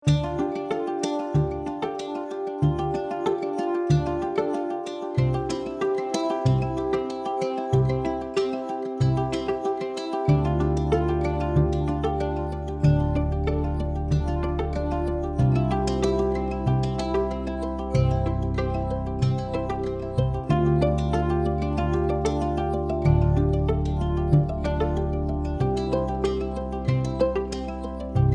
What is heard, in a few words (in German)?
Kuschel Uke